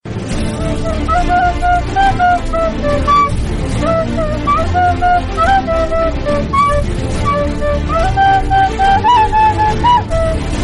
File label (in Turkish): karayip fulutleri alert